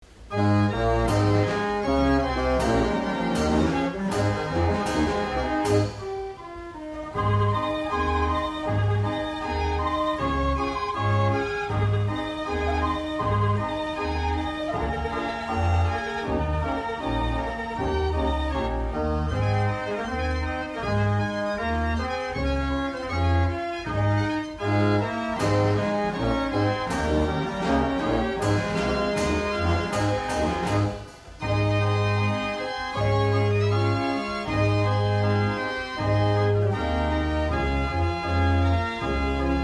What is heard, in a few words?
southern melodies BELGIAN, band organ